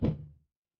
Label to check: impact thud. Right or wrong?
Right